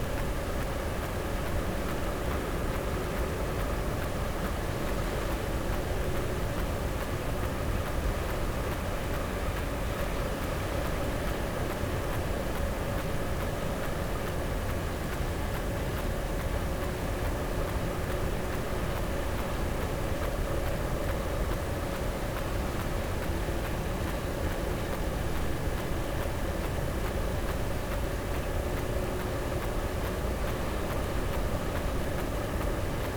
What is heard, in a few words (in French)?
cachalot negatif, Physeter Macrocephalus